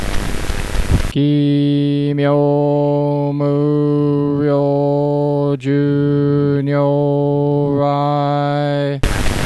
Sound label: Chanting Wave